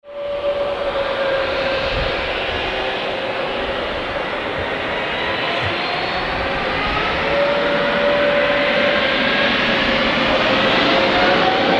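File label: vzlet samoleta airbus, Airbus airplane takeoff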